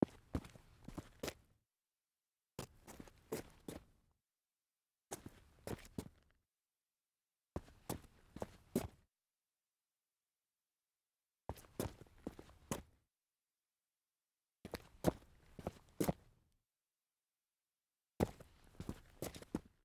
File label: footsteps concrete